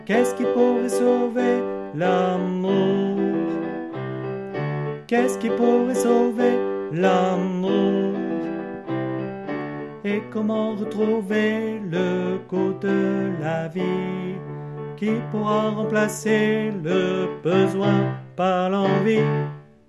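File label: Refrain Soprano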